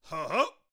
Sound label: Teacher Attack Vocals